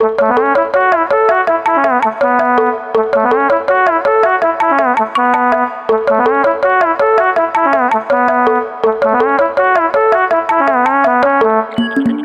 jungle

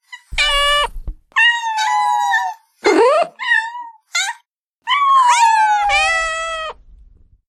cat voice mix

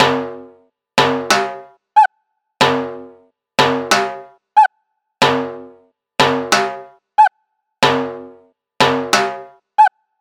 goofy rhythm

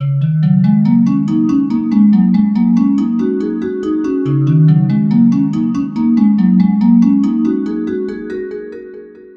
bell short

